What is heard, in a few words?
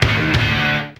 MUSIC STAB; FX; AMG - Vince Clarke Lucky Bastard